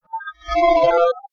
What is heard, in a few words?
Sound Alien